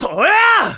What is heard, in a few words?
Jump Strike